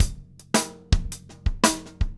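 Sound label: triplets, Drumscores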